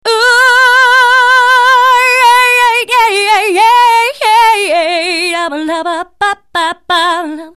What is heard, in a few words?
Oooo badada, Vocals